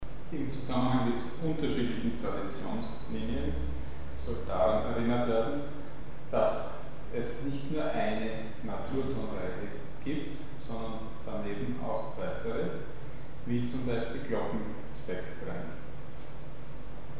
Andere Intervallordnung in anderen Naturtonreihen; Mikrobuch

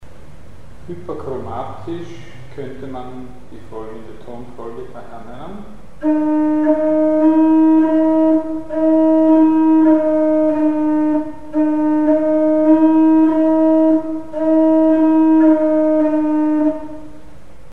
hyperchromatisch; Mikrobuch

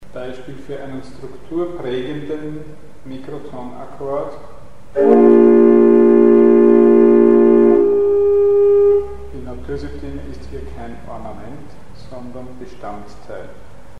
Mikrotonale Struktur; Mikrobuch

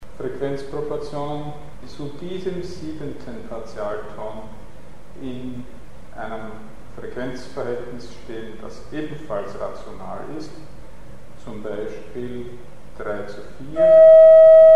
Musik mit 7er Frequenzproportionen; Mikrobuch

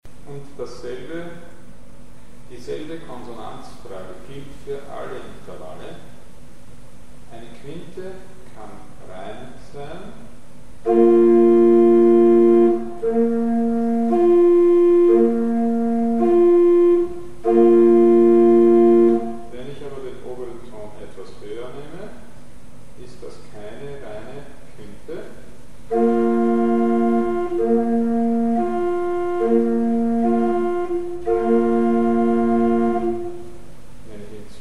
Quinten verschiedene; Mikrobuch

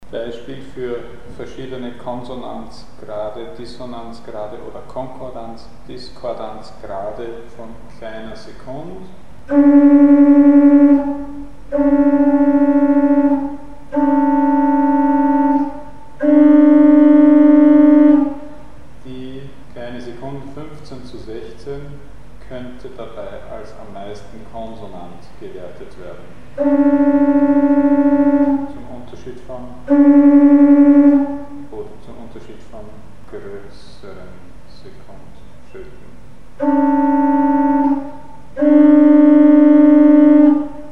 Kleine Sekund - unterschiedlich dissonant diskordant bzw. konkordant; Mikrobuch

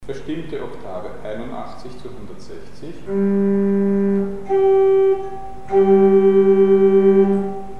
Verstimmte Oktave 81zu160; Mikrobuch

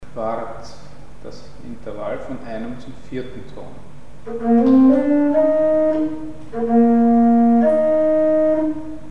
Quart; Mikrobuch

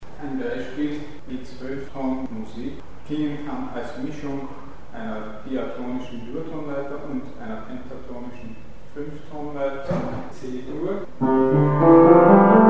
Zwoelftoenig gemischt Durtonleiter C mit Pentatonik Fis; Mikrobuch

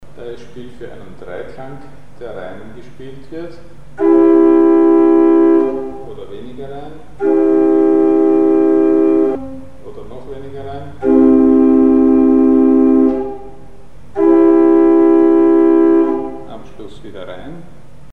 Durdreiklang rein und enharmonisch und mikrotonal substituiert; Mikrobuch

